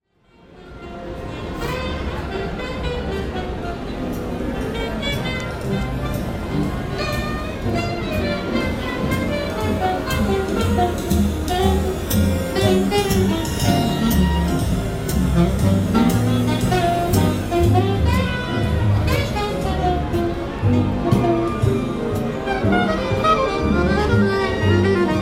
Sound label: Horn trio jam